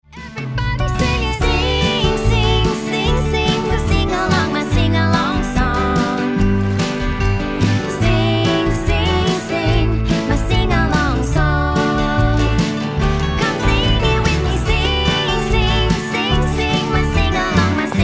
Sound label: Sing Along Song